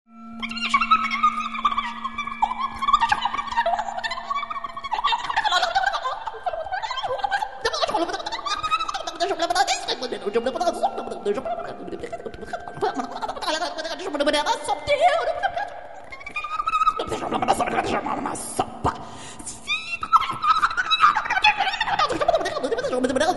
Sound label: solo improv; multisonicfest2005